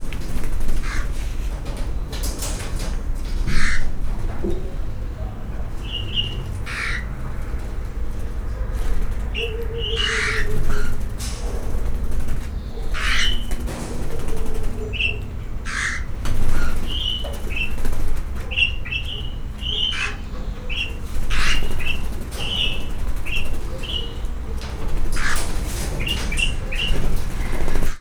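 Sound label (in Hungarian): papagájok repkednek, egyszínű királycsőrike; egyszinu kiraly csoricse; szegedi zoo 2011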